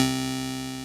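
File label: ORG Vox, Continental organ; Continental2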